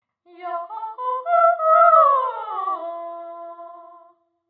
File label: singing conv